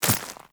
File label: Inf Small Walk Dirt, Infested, Footsteps; Enemies; Warframe